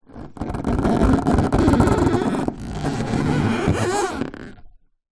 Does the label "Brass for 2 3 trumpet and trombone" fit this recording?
No